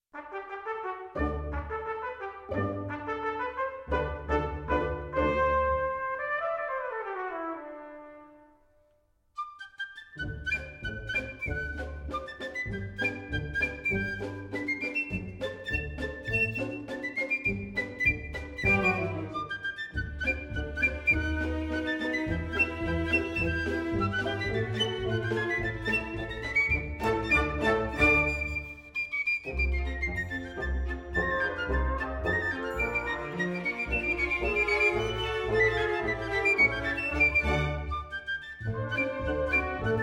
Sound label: danse des grillons, piccolo